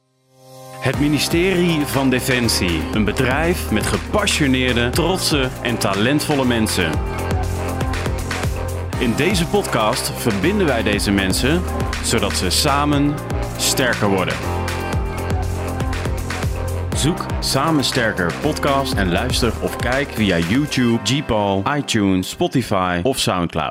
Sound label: Podcast Jingle; Defensie Samen Sterker